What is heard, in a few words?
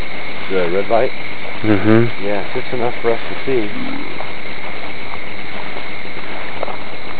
belch or growl, E.V.P.